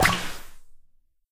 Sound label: Minicopter Death Puff; doodle jump 2; android